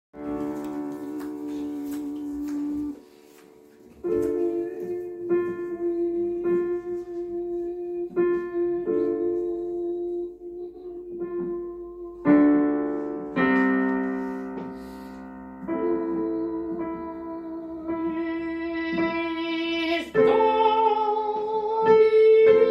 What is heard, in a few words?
Agnus Dei Fauré, Requiem; Requiem de Gabriel Fauré